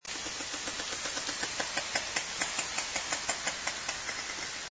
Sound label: echolocation clicks, orca